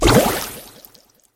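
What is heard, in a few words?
attack sfx; clancy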